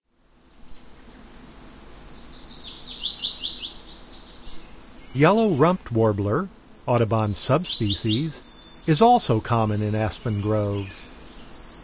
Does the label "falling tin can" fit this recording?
No